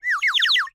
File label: Bird whistle, clay, painted, water, airy, wispy, phrase, rising, speedup, alert, 5 chirps; Eiravaein Works; Vaeyan IV